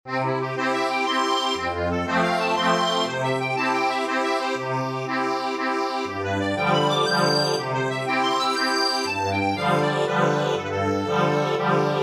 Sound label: accordion